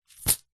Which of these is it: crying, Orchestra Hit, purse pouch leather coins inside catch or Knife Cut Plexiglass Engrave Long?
purse pouch leather coins inside catch